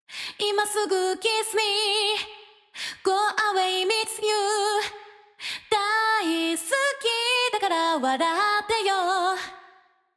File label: reverb on